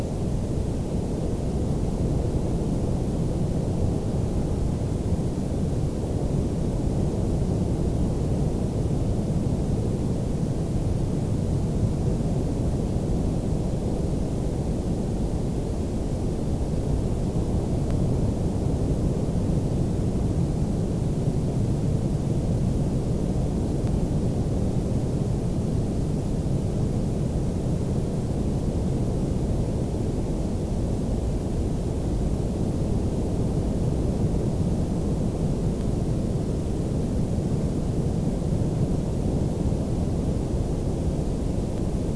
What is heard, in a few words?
WFR audio emfisis